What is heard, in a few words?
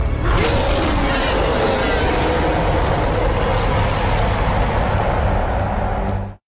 Roar; Hulk